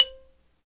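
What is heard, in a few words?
XYLO, Afro Xylophone; Roland LCDP11 Africa VOL-1; Bala & Xylo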